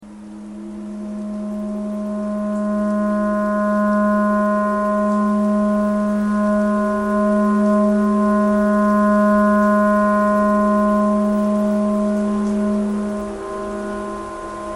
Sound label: clarinet tornado siren noise